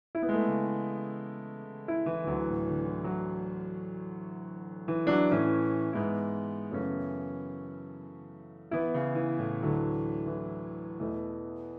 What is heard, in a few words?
Kawai EX Pro